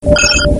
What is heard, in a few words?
message; star trek